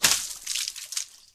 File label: Blood Explosion